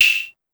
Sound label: Shaker, Clavia DDrum AT, Pitched Down